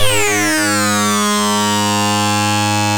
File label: ARP Synths, RUMBLE REZ G1; E-MU Formula 4000 Series Vol. 3; Analog Odyssey